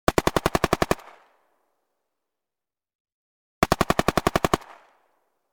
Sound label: gun ak 47; still north media